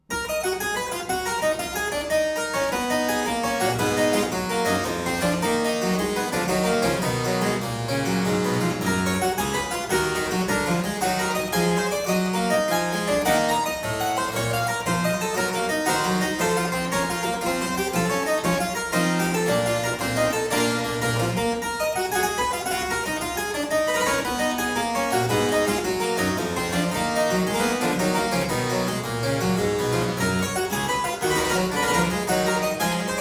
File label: Suite in E minor Gigue, Harpsichord; Buxtehude; Complete Harpsichord Music